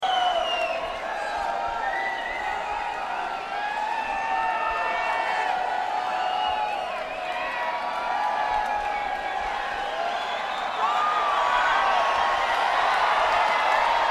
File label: Crowd